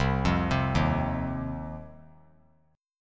C Minor Double Flat 5th C down 3C 3F 3A 3D; Cmbb5 arpeggiated; JGuitar

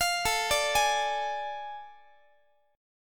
A Major 7th Suspended 4th Sharp 5th, Guitar; AM7sus4#5; arpeggiated; x,x,20,19,18,19

